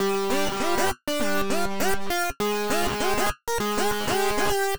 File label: Synth Line 100 G, hiphop 100bpm; musicradar